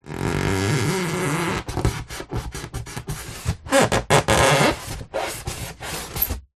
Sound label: foley polystyrene hand rubs creaks; zapsplat